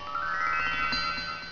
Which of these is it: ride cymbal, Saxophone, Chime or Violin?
Chime